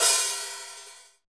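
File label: hi-hat, hi-hat real; Sound & Vision - Gigapack I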